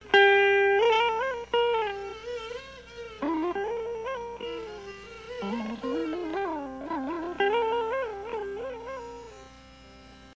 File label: End rhyme with phrases that start lower in range